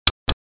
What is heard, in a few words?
click OK; Fowler Graphics; Buttons